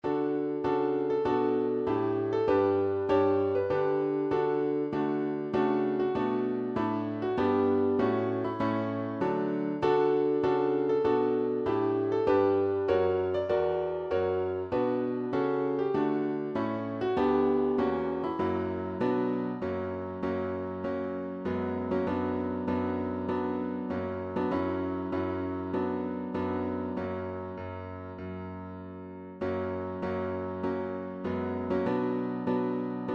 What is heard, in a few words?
pomp march